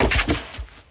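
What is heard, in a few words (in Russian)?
короткое быстрое шуршание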